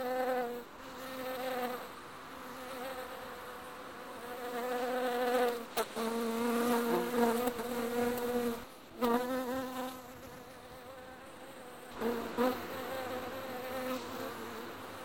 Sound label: fly buzzing